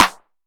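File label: TR909 Snare Clap, Roland SH-32 Drumset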